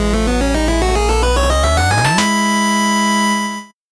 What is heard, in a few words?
8bit jingle slow